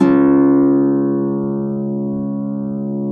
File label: DB1 HARP; Complete Harps; USB Soundscan vol.62; AKAI